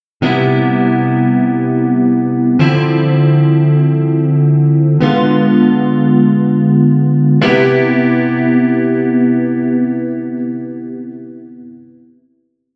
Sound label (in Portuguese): Cadencia C7M Dm79 Do C7M